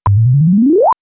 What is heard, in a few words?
bottom spk hp; xiaomi rodin